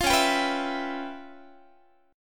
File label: C sharp 7th Sharp 9th Flat 5th C sharp Guitar; strummed; C#7#9b5; JGuitar